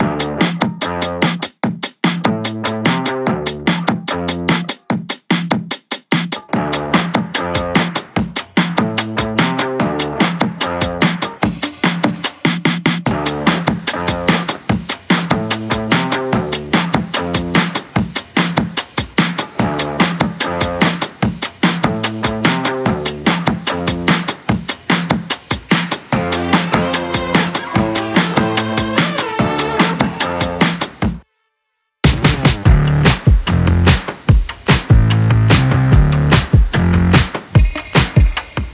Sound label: a new dawn is rising instrumental